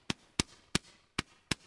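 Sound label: ambience Party mode Crowd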